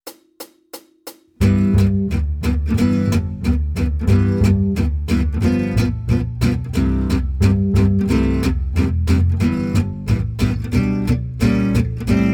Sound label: Jazz, Guitar; Guitar Techniques 2019 Issue 290 January